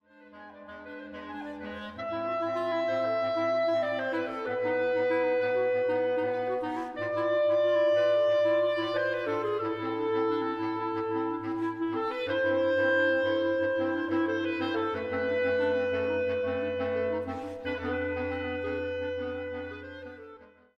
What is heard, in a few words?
CLARINET; Astor Piazzola